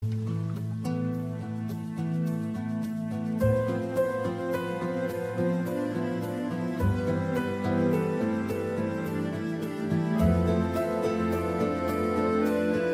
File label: ringtone